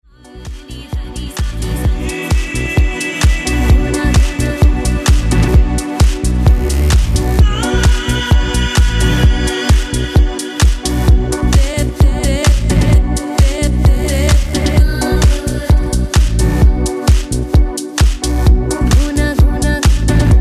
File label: dance music